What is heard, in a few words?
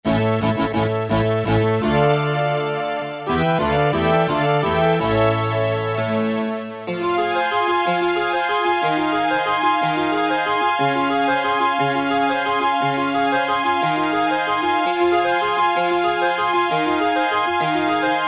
Harpsichord + Strings, Casio CT-370; Tones